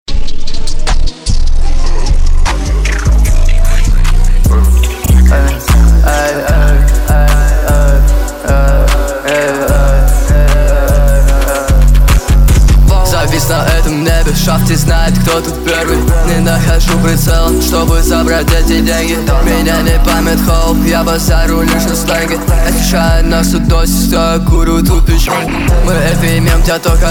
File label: Trap Type Beat